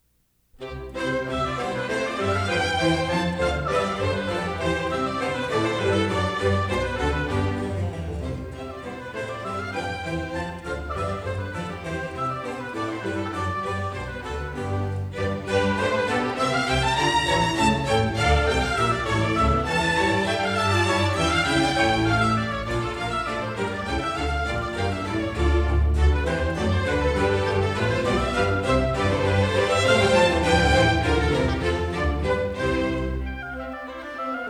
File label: Bach Orchestral Suite No1 BWV1066; Scherchen